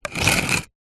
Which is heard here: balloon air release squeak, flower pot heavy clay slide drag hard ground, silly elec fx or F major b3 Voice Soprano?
flower pot heavy clay slide drag hard ground